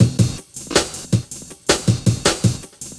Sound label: Beat, Futurist Drum & Bass; Drums & Percussion